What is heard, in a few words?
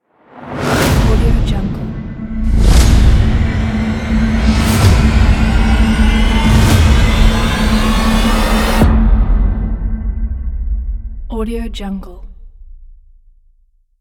blockbuster intro logo music